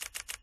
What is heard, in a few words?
Camera Shutter; Meitu; V7